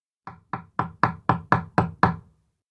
knife board chop; anemoi

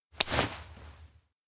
hold then pull; awayuki; anemoi パッケージ版 1.1

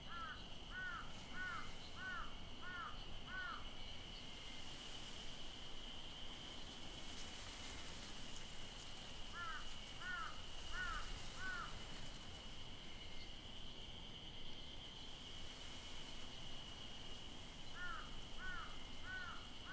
outdoor school evening

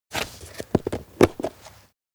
tupper pizza take; anemoi

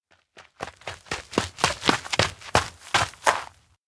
steps outdoor ground run up; rikka; anemoi

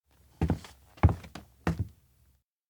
steps indoor abandoned cafe walk away; anemoi

